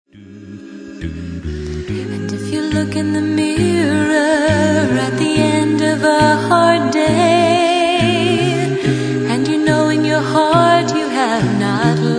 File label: a capella hymn; doubly good